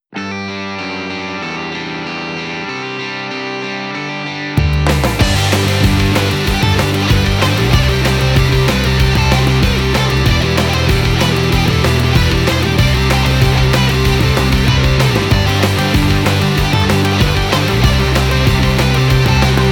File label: loud hymn